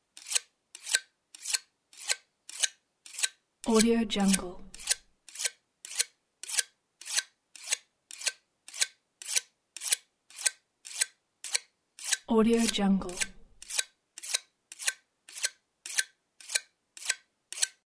sharpening knife

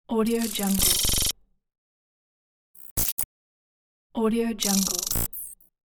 robot cartoon

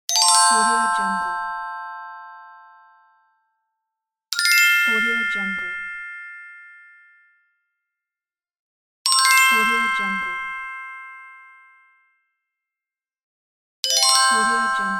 fairy appearance

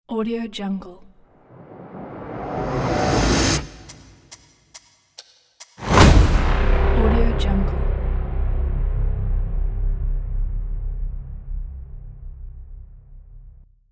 cinematic logo reveal

